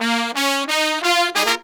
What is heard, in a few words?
Phantom Horns, 132BPM; Zero-G